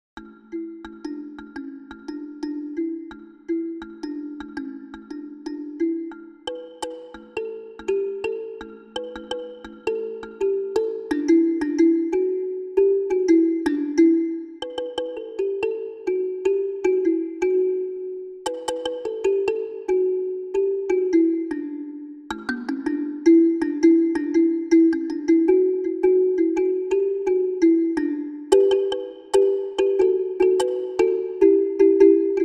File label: Coconut Kalimba